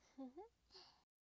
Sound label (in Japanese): 笑い声; ループボイス